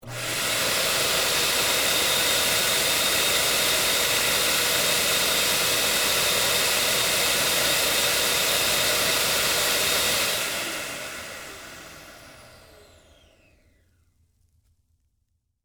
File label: Snow Machine, Theatrical, Stage; Film Sets; BlastWave